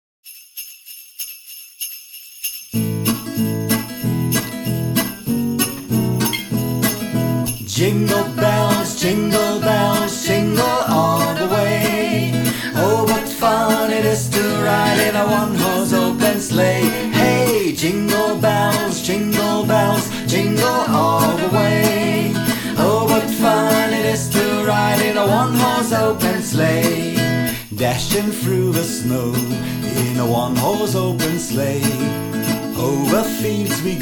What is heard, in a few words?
Jingle Bells